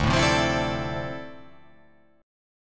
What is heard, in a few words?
D Minor 9th, down